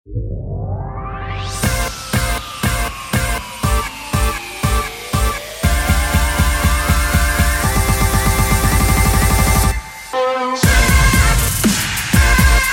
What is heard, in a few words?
Nokia Lumia